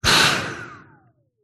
household vacuum cleaner stick short suction burst close to end of open; dyson v7; hose